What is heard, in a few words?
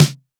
Std. Snare, Roland BK-5; Snares